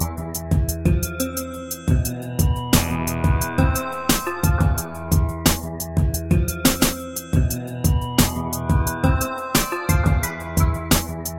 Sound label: trip beat